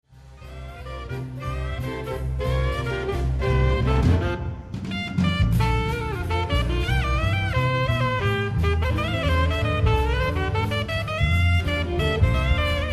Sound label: sax solo